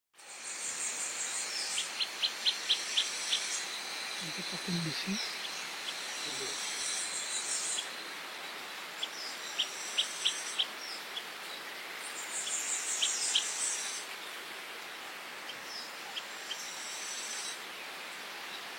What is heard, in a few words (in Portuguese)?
canto do papa moscas cinzento